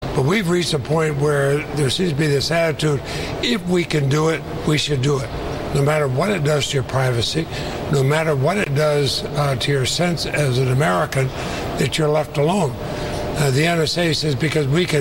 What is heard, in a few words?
electronic house; Leahy